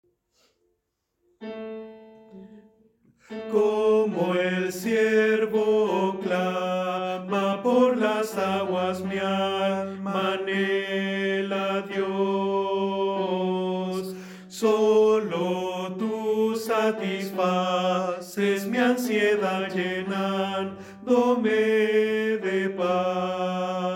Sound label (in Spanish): Tenor, coro